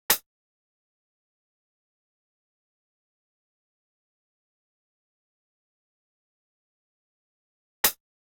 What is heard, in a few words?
Drum Loop, 124 BPM Percussion; Cymatics; Vibes II Drum Loop Collection; Aftermath